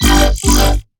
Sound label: Melodic Bass G 140; One-Shots; Bass Hits (Melodic); Spectrum; Ghosthack; Color Bass Essentials